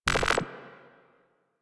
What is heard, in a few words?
Corrupted Notes H; Warframe; Bard Quest; Bard Boss Fight